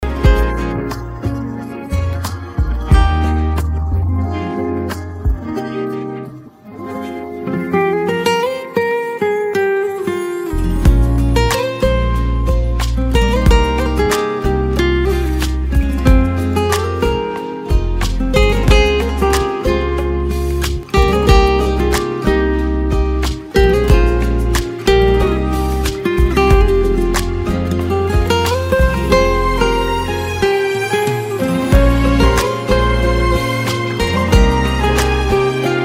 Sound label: bg music